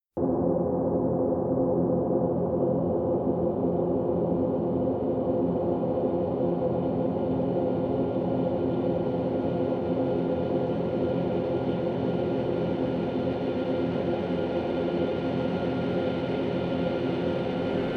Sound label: the noise of flames crashing